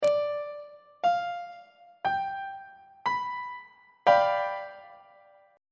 a double flat dominant 7th 2nd inversion on treble clef